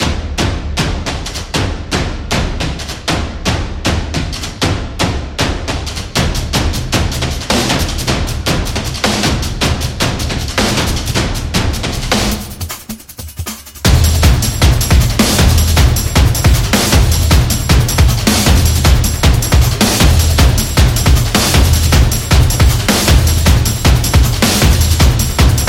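Sport Extreme, Football percussion background music inspired by samba rhythm, stadium energy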